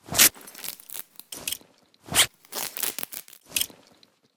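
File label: inventory toolkit outfit, interface; STALKER; IWP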